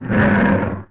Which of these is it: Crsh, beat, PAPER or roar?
roar